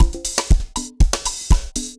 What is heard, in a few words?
dancefloor perc 120 bpm